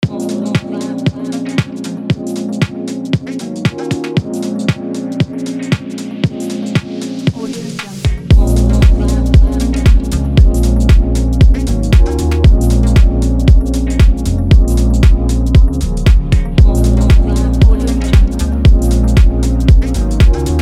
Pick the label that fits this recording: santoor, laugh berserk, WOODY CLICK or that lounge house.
that lounge house